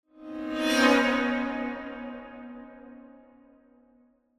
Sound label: Symphonic Hit Short Show Up Chords